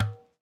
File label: Tennis Racket Ball Hit Light